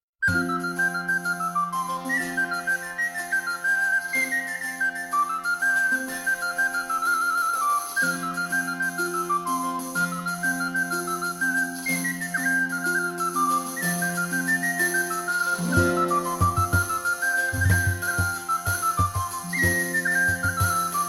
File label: Hornpipe; the sims medieval